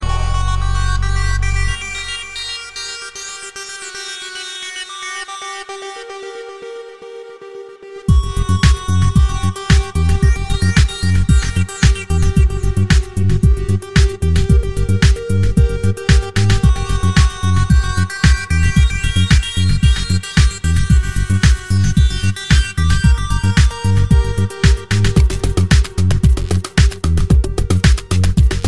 electronic

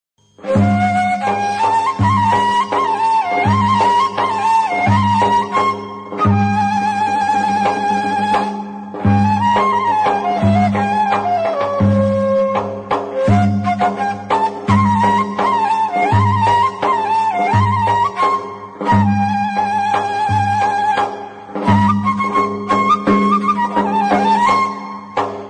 Desi Flute

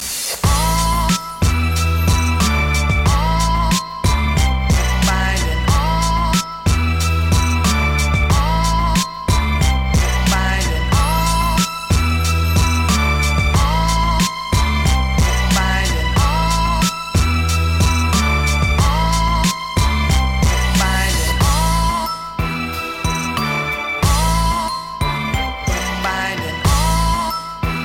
Ooohhhh

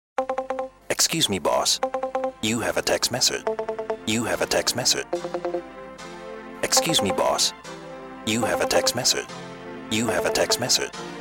Ragga

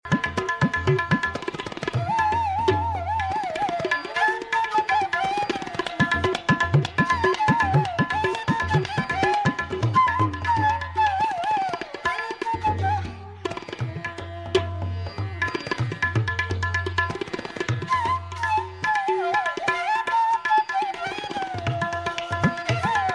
Bansuri N Tabla

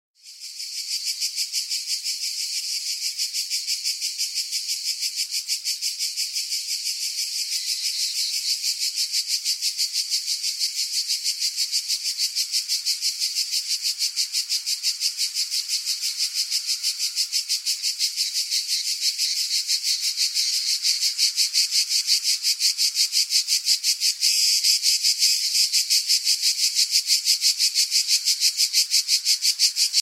Cicada

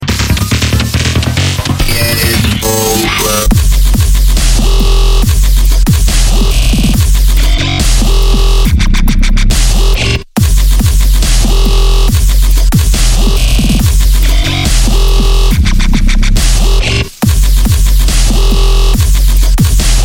Game Over Remix

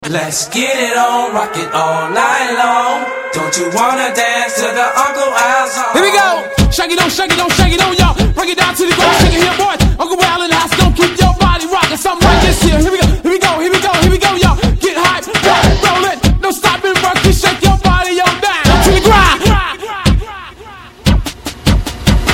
Bass Is Gonna Blow

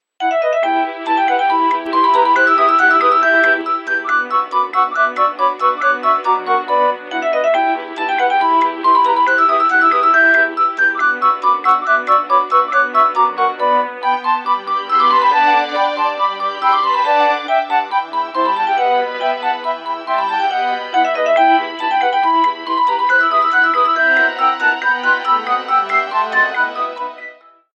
Best old Nokia ring

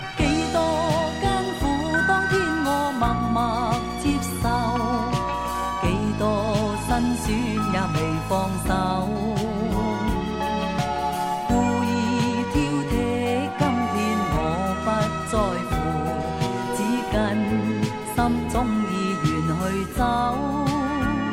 Chinese Song

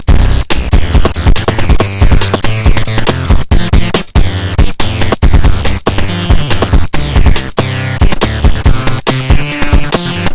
Noisy Beat

